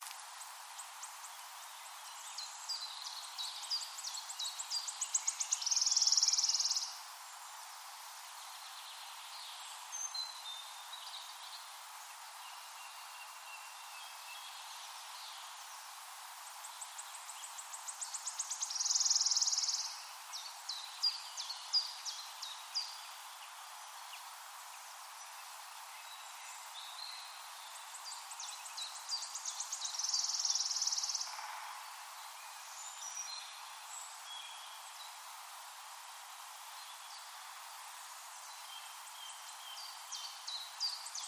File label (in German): Waldlaubsaenger; Ornithologie